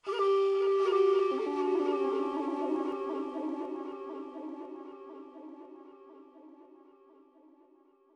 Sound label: flute, ambient